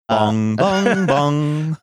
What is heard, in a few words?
singing nbc chime